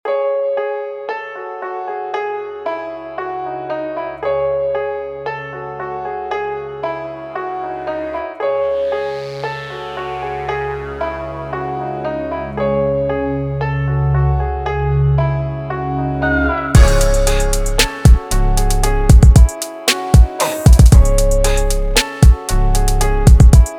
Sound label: beat